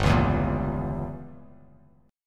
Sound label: G Diminished 7th down Bass Standard; strummed; JGuitar